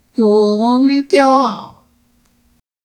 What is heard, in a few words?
note yes, instrument